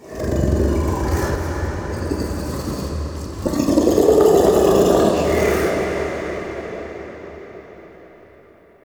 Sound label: Brain Grunt One Off; Warframe; Infested Eris; Inf Flesh Scape